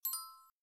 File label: single right click